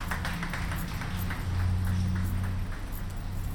People Clapping From A Restaurant

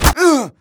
pain, player; Team Fortress 2

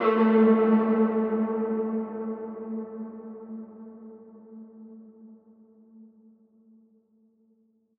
Ethnic Shot, Noises; HYPERBITS

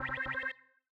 squirty synth; 'Effection' samples; lolicore

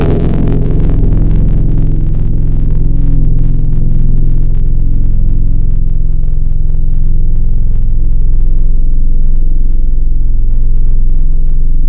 Futuristic, Tropical Mallets

